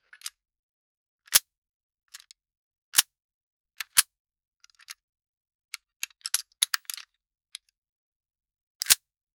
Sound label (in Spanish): armas recargandose